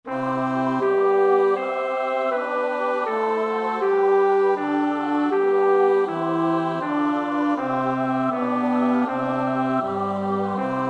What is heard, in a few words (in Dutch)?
bas; Bach (Johann Sebastiaan); BWV 025; 6 Ich will alle meine Tage